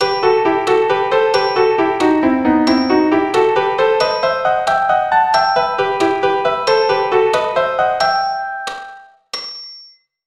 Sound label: Em harmonic, keyboard